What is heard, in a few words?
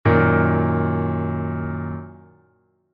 D♯m, Chord Inversions